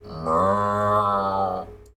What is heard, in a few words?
cow calm